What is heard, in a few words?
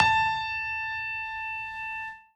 piano melo